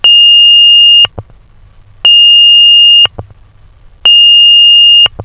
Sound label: tone